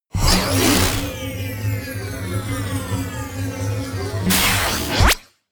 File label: promo pad